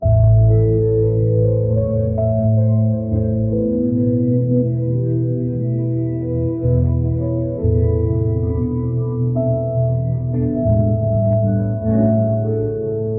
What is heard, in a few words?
calm meditation music to play in a study room